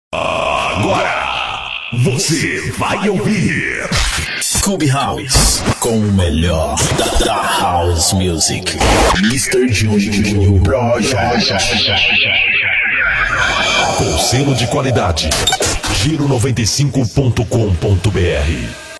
CUBE HOUSE

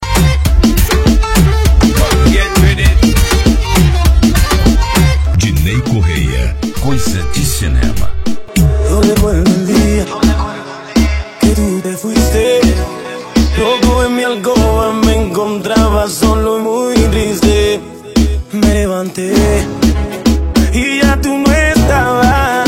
Reggaeton

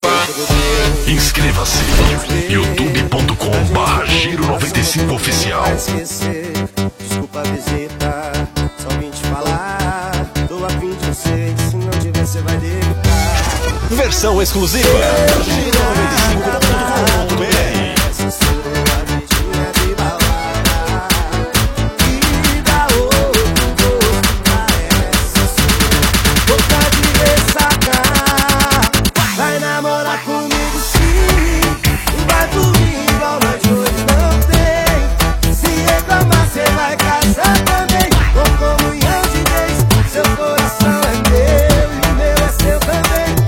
Funk Production Remix

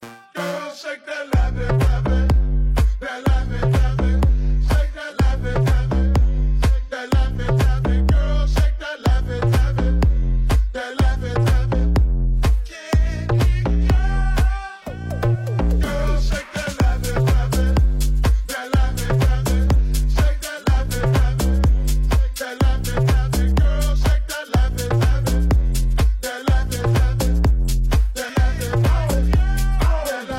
Drop House

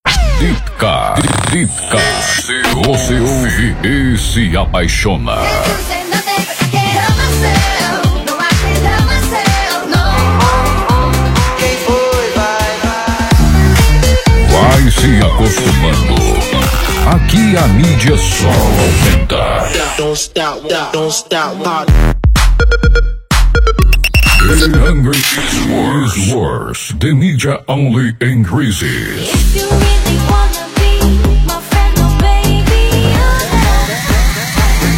DeepCar

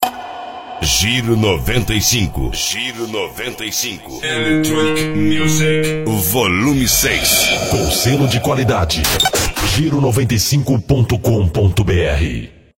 Electronic Music

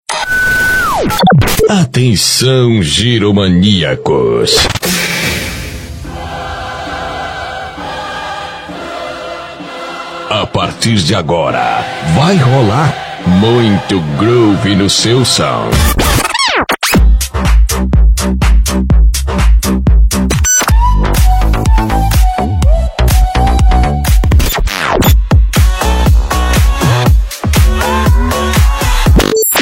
Groove Beats

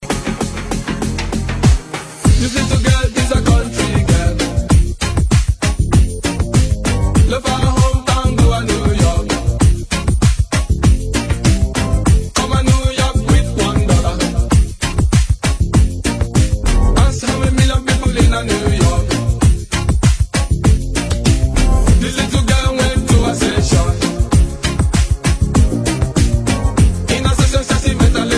Dub Reggae Pop 90

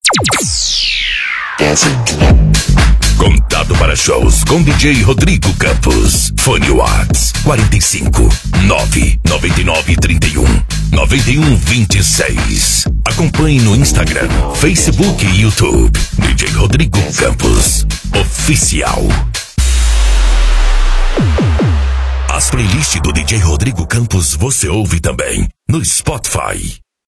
Electronic Music

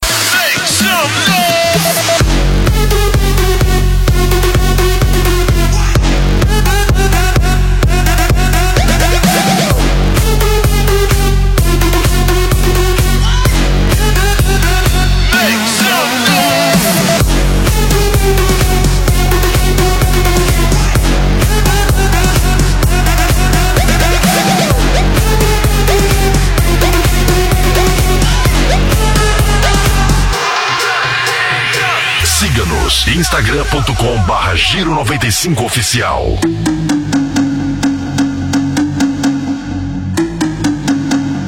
Electro Sessions

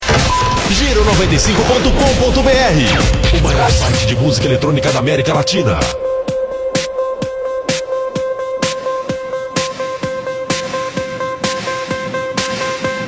Electro

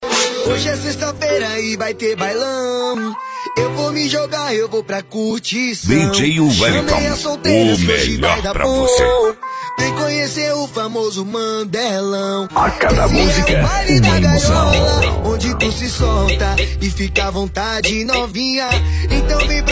Funk

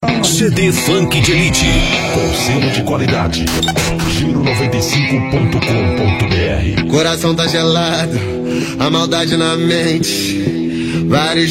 Funk

